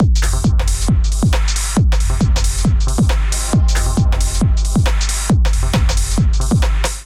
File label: Kit Melodic 136 bpm; MIDI Clips; Drums; Hyperion Kit; Ableton; Factory Packs; Skitter and Step